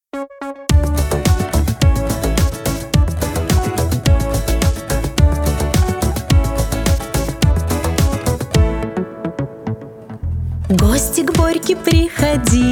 instrumental

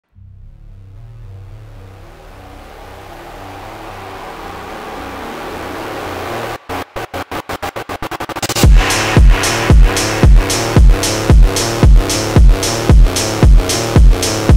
fonk slowed

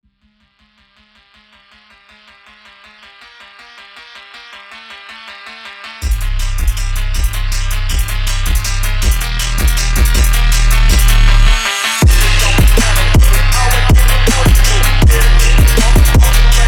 VHS phonk

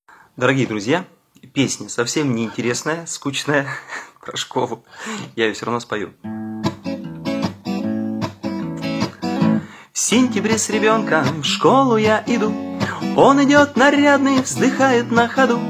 acoustic

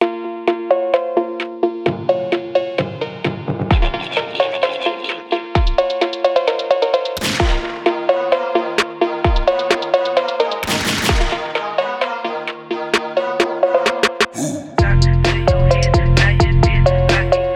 fonk

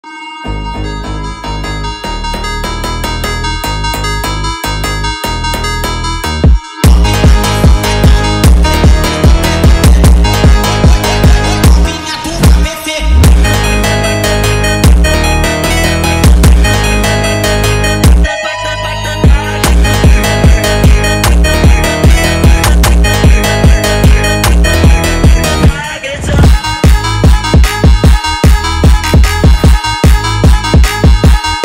brazilian funk

